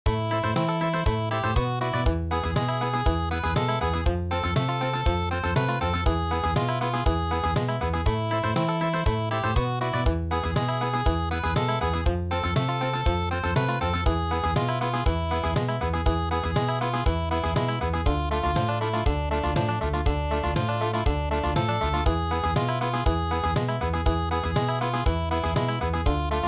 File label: Country, midi; Hava Nagilah